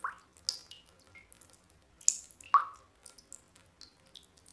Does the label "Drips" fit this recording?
Yes